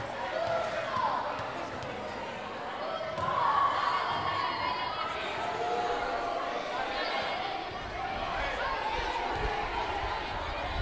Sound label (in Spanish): Gotas